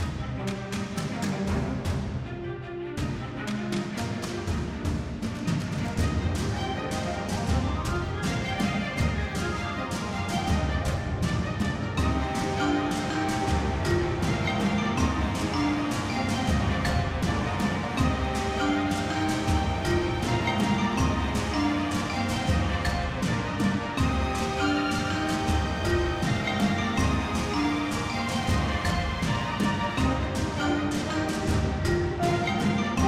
misc battle

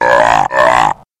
monster molten

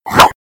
miss

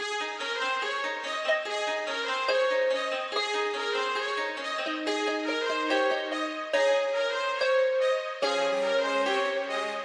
town human; maraketh

